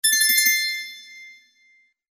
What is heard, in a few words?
fast bells; answer quickly; standard ringtones